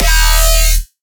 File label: Bass D# Voltage; Dubstep; Bass One Shots; Ghosthack; Dubstep Sound Arsenal